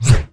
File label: WOOD SWING; Fate